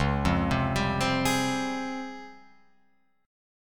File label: C sharp Major Sharp 11th, Guitar, Open F, chord; C#M#11 arpeggiated; JGuitar